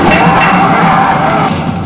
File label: SWORD